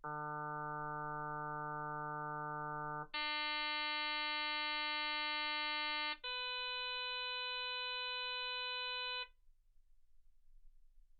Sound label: harm sinus 48kHz